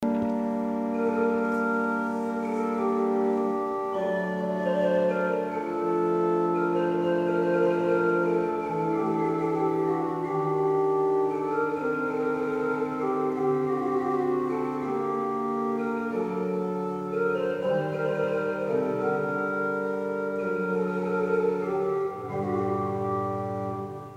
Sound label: pipe organs